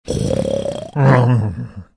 Emote Sleep; Hoggy

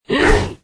giant gob swing

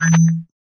dry fire; janet

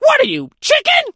crow hurt

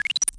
bleep

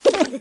skeleton atk

